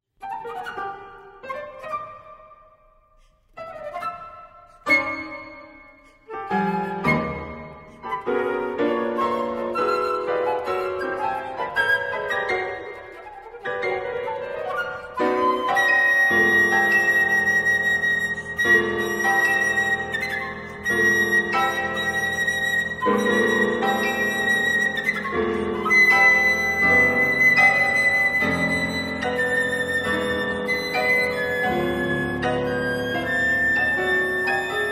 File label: Duo For Flute Piano A Fanfare